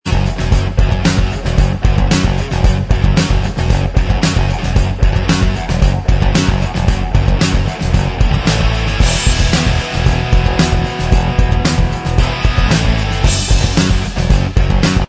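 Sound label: instrumental, track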